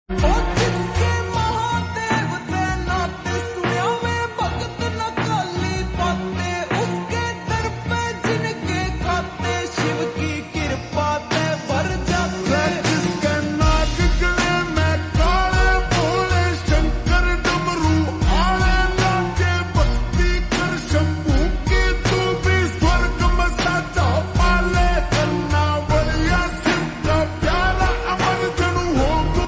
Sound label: Slow Reverb Version Ringtone